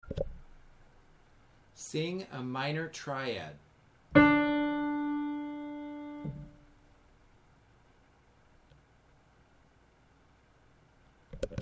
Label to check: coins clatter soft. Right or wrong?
Wrong